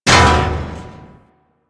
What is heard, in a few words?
Mech Head Impact; Nechro Mech; Vehicles